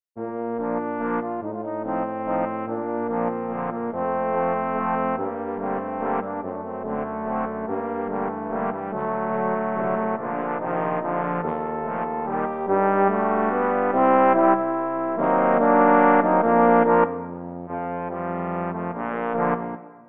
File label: Spanish Dance